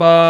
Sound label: VOX BAH E4, Scats Choir; 90s Sample CDs; Club-50 - Foundations Roland; Short Scats